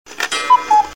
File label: Cuckoo Watch